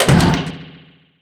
armor cast; weapons; dota; shredder

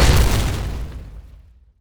echo slam small; weapons; dota; earthshaker

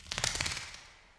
bat flaps; ambient soundscapes; diretide batflaps; dota

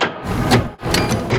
missile anim; tinker

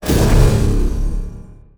reflection, weapons; terrorblade

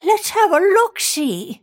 move pain; snapfire

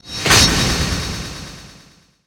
impale cast; weapons; dota; kez

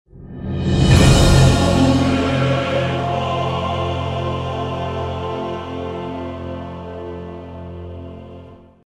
music stinger; stingers

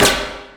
battery assault launch; weapons; dota; rattletrap

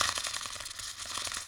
idle, courier; npc; drodo

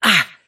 pain; davion; dota; dragon knight

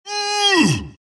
grunts; dota; skeleton king

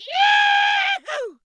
yahoo; soundboard

